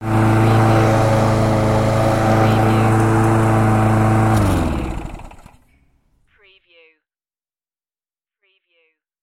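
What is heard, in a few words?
Lawn Mower Turn Off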